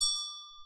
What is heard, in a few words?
Mini Bell; Whatsapp